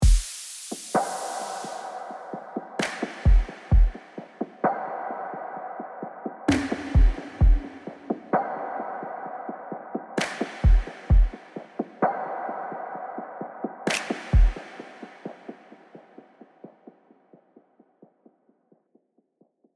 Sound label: E. Drums Percs Stack